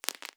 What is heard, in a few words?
Multiple Small Pops